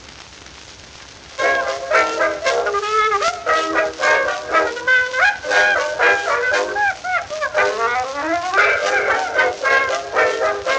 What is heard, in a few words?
Fox Trot